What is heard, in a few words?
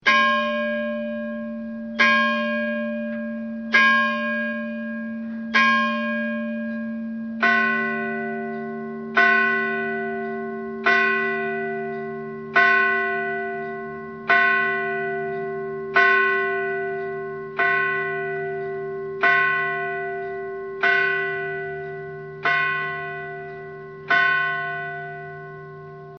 bells ringtone